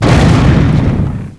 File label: xbow exp, weapons; XDM